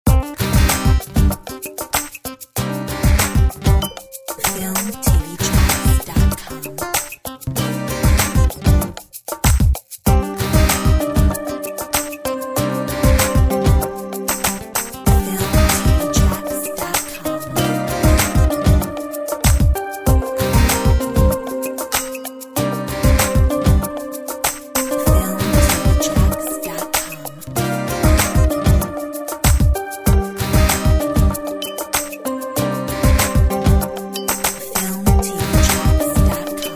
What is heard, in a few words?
Urban Life